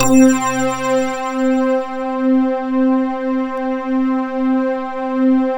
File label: MET C4; Sound Gallery - Stack Ware